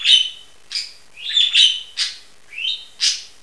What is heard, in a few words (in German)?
tschirp; Wellensittich